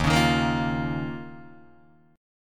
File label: D sharp 7th Suspended 2nd D sharp, Guitar, DADGAD